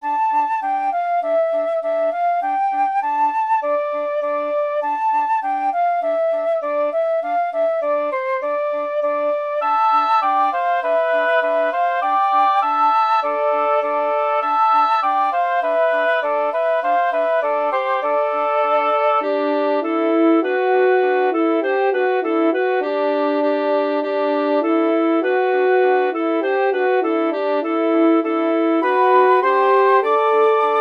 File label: wind quintet